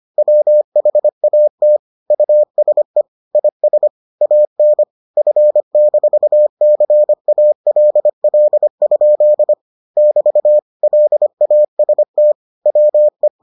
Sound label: morse